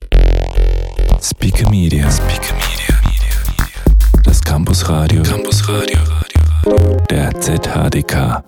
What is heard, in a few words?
jingle; spikamedia